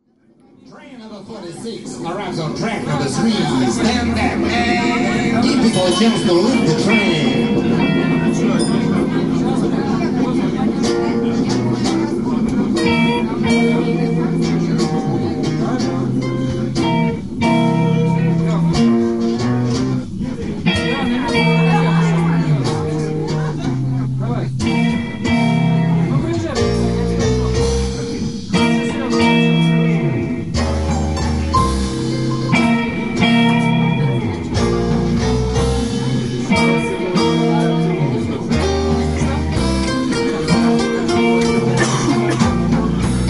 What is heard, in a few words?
Blues